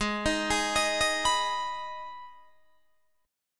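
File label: Ab Minor chord, Guitar, Open D tuning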